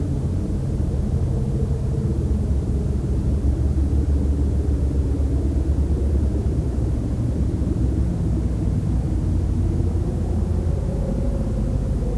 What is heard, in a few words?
eerie junkie atmosphere